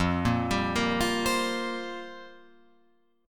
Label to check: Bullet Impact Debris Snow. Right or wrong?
Wrong